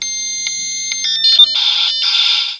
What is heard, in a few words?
MODEM